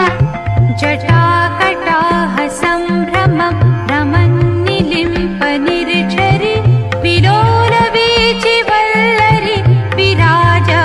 Jata Gatah Sambhramh Bhrimanni Limpa Nirjhari Female Ringtone